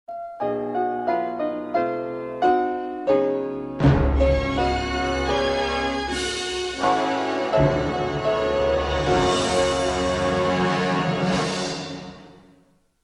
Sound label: fanfare